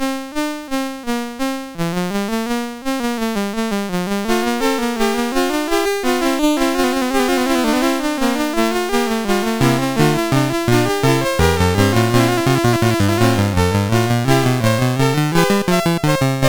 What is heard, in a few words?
Fugue No 2, MOS6581R2